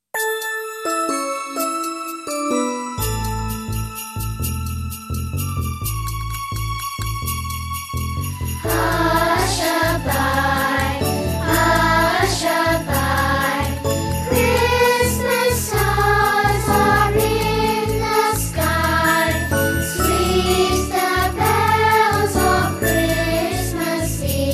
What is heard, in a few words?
A Christmas Lullaby